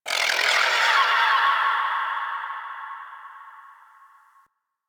Landscape Cave Creature, Ambience; Warframe; Venus Open World; One Offs

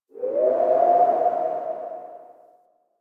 Gas City Distant Wind Textures; Ambience; Warframe; Corpus Gas City

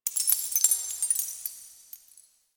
Metal Debris; Ambience; Warframe; The New War; Quests; Zariman

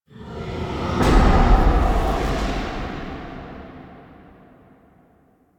Circular Plate Large Distant Traps Activated; Warframe; Orokin; Triggers

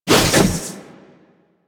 Dodge Whoosh; Warframe; Wraith

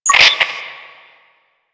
Transmission Stop; Alad V; Warframe; Quests; The New War; Veso

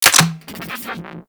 Shock Rifle Mech, Weapons; Warframe; Corpus

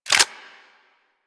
Seer Clip Chamber; Warframe

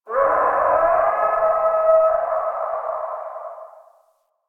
Landscape Distant Creatures, Ambience

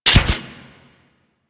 Microwave Pistol Mech; Grineer

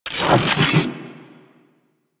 Flame Spear Throw Charge, Grineer, Weapons

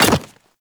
Hyena Micro Step Default, Footsteps; Warframe

